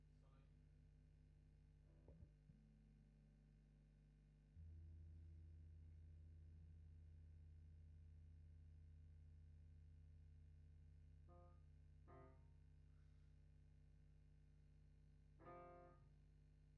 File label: GTR B